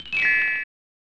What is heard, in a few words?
Chimes; Young Merlin